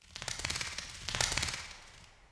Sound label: bat flaps; ambient soundscapes; diretide batflaps; dota